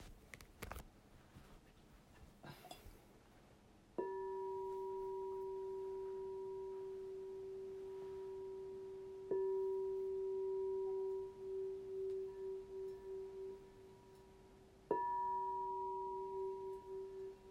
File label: bowl